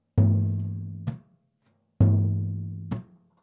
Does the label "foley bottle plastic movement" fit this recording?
No